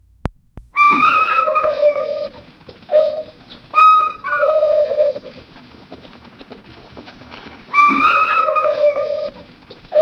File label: two animals trotting with, clip clop